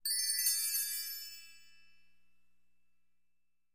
Music Trans Bell Tre, chimes

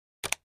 Button Hollow Plasti

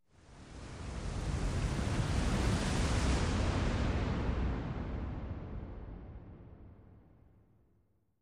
Arena Crowd Wave Whoosh; sports

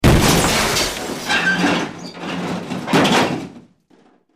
Medium Impact W Debri, metal & glass

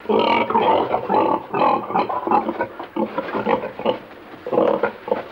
Pig Grunts Energetic

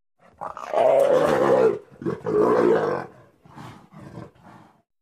Leopard Gag Throated; big cats

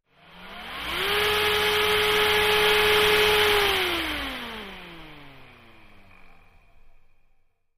Electric Turbine, power on off

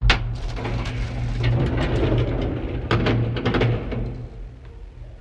Elevator Door Open

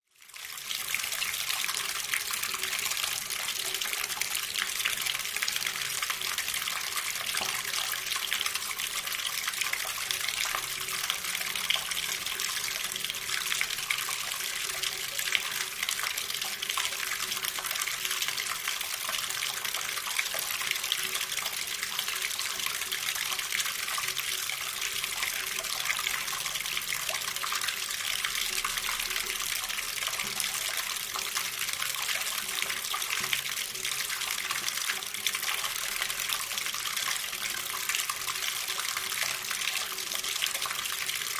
Wash Basin Metal, Medium Stream

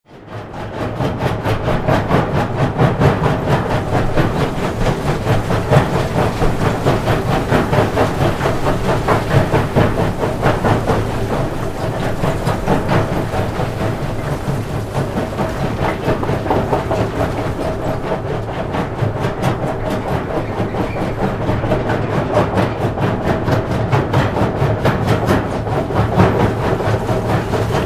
Rock Crusher Runs, factory

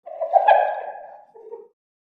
Hyena Unknown Type, hyenas

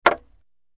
Wood Staff Hits Mediu, spears & staffs

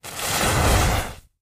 Matches Books Lit; lighters & matches

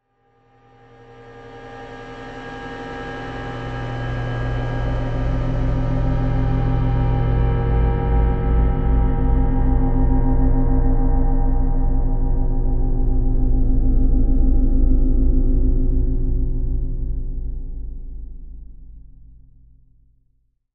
Space Ship Landing

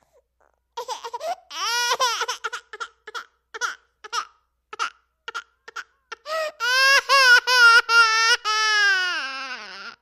Baby: 10-month-old, Crying. Interior.; Mono

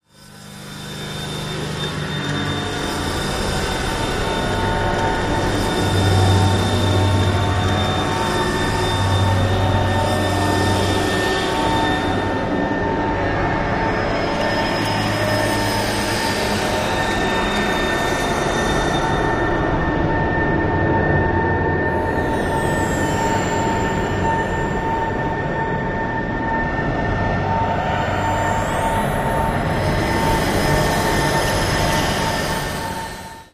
Arch Reactor Low Motor, metallic

